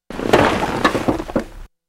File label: Bed Squeak More Bang; bed movement